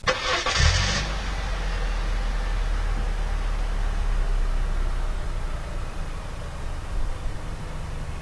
car start

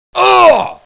arm hit

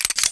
ppk mag out

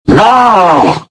attack, paralyzed subject

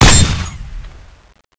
nailgun fire

